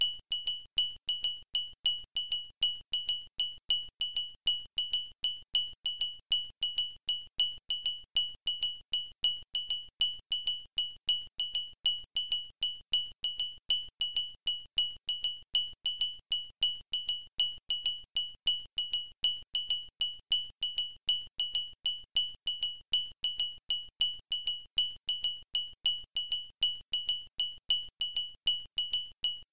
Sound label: vodu maisepol trian